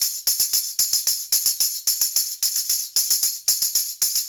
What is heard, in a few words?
Raw Funk Tambourine, 70s Analog Funk; Sonic Foundry (Sony Creative Software); What it Is! 70s Analog Funk